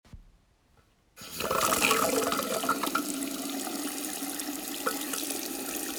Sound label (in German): viertes Geräusch